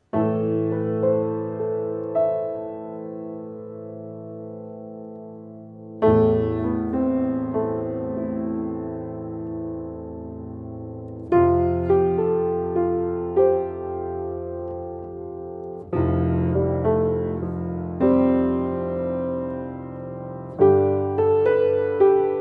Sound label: Piano; AMI KM100f